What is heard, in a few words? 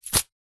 purse pouch leather coins inside catch